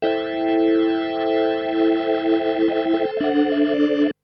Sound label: Synt; FUNKACID4